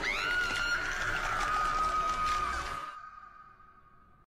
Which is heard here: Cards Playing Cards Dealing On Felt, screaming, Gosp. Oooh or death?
screaming